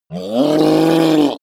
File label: reptile dying choke